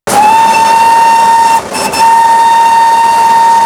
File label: whistle, castle class